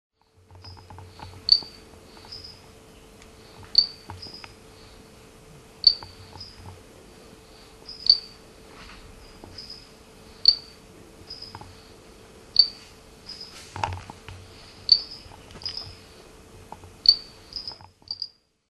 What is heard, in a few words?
Mantella milotympanum